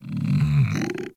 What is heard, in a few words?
Kubrow Tame Interact Affection Closed Mouth Short